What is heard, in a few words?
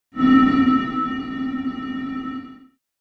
Bottle